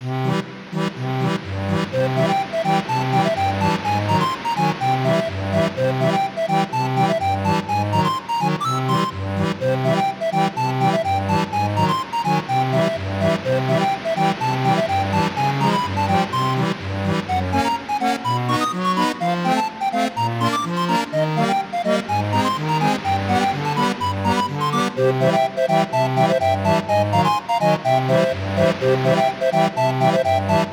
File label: (with Ambience); Banjo-Kazooie; Treasure Trove Cove - The Salty Hippo